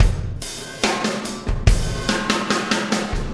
Impact Crater Beat, Processed Drumkits

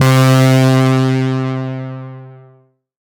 pad C3, ARP Odyssey; Polyend; Simulacrum Sounds; 1 Shots; Synths; Pads

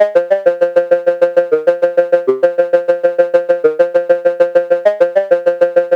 XPANDER; AMG - Vince Clarke Lucky Bastard

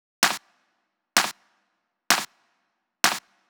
Drum Loop 128BPM Clap; VEC5 Drumloops; VEC5 Multi Loops; Loop Elements

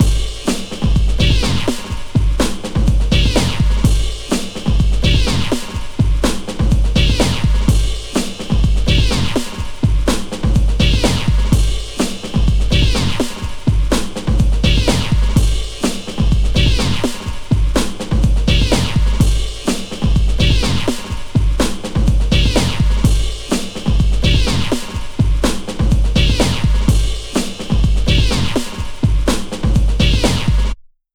125 Rolling Tekfunk, Breaks; Lockdown Breaks

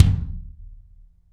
BD AMBIENT, Drums; Real Mega Drums

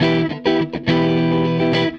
Gretsch Guitar Loop

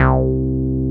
MC 202, BASSES

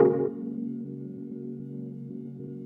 Tone Stab; Lost Tapes From Mars

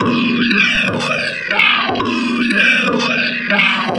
WOWING TALK, TALK BOX; Spectrasonic Distorted Reality 1

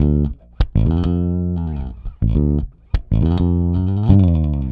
Rock, Bass; Bass X

